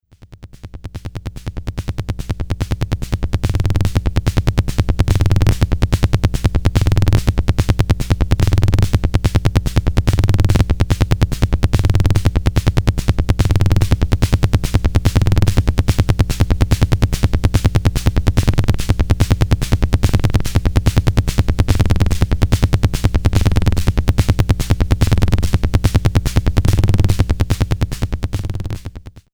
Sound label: gabber